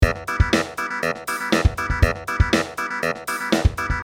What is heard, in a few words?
clav 16beat